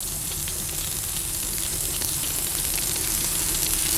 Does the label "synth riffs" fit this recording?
No